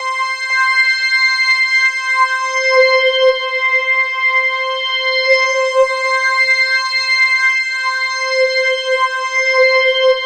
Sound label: Roland JV 2080 LFO C5; MISSION LFO